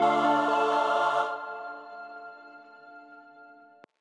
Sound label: Level Up